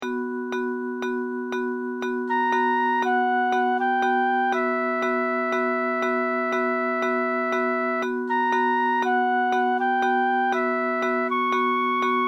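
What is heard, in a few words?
images flute & guitar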